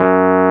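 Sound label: WURLY NO, Wurlitzer electric piano; Sonic Reality; Vintage Timetraveler; WURLITZ EP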